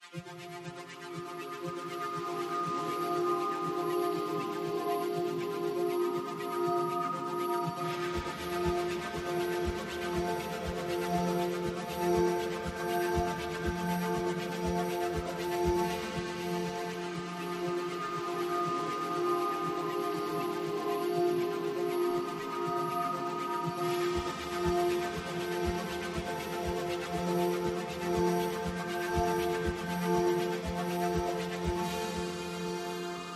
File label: Oratorio Drone Light